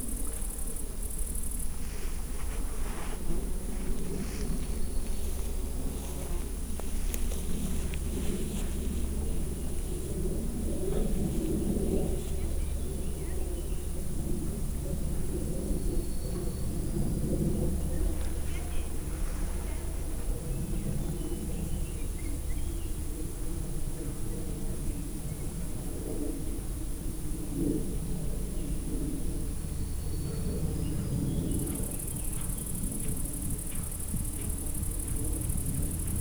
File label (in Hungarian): poroszkáló csapat repülő, ló; állathangok; paraszt udvar